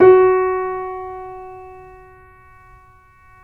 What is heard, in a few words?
KEY, YC7; Roland L-CD701